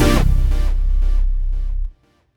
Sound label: C3, Synth Line; 90 s Sample CDs; NiceBeats; SynthLine VOL-18